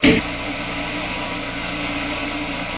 Machine Turn On Whir; fx & static

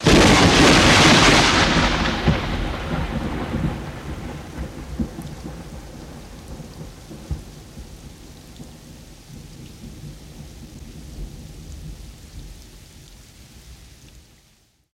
Thunder Crack High, thunder

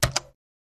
Computer Keypad Single Key Stroke; typing

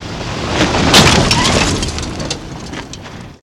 Car Over Bump Thwack

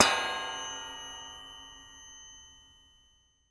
Metal Plate Edge Hit, small impacts; Metal Plate Edge Hit, Ring

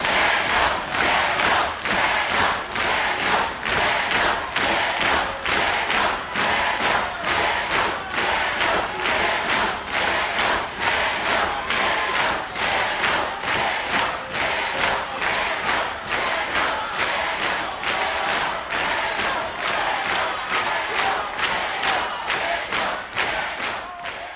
Crowd Chants "Daniel"

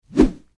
Slow Pipe Or Heavy Obj, whooshes

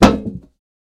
Tin Can Metal Crinkl

